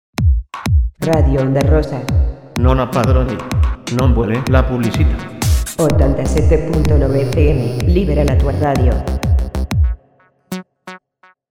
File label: jingle (techno)